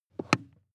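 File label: sports car interior pedal clutch step hard; verkehr transport; autos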